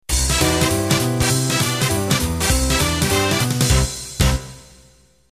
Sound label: New Jive end